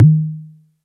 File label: Elec Tabla, EMU Orbit-3 drum machine; 300 Drum Machines